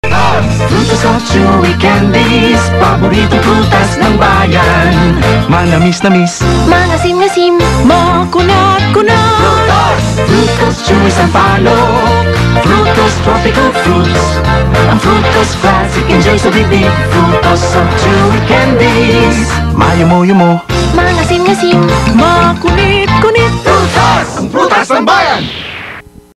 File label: Candy TV Commercial jingle